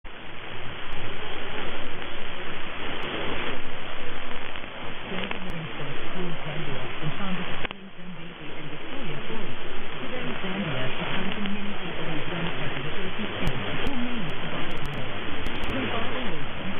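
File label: TIS Victoria BC Canada, radio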